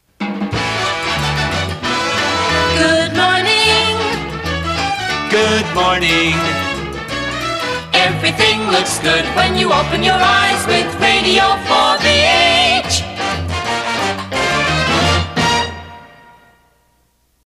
Good Morning, (Girls) Good morning (Boys) Good morning(Group) Everything looks good when you open your eyes with; 4BH; PAMS